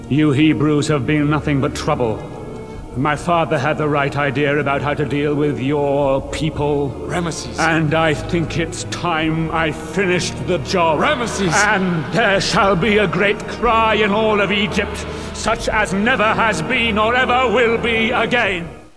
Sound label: cry